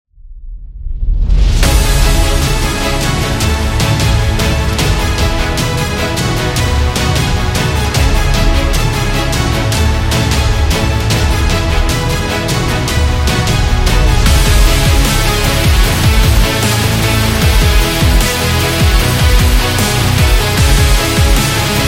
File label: Sport Power, epic sport metal